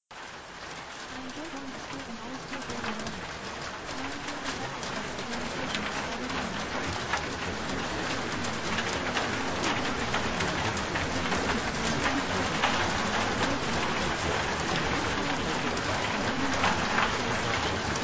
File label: Rain on a Tin Roof; Become the Perfect Student; Requested Subliminal